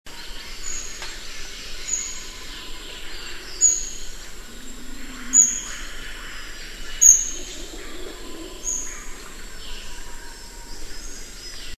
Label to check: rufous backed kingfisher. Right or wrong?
Right